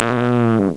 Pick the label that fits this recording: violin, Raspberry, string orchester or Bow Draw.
Raspberry